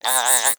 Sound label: cartoon insect buzzing